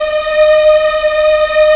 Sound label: VOX African; Roland LCDP11 Africa VOL-1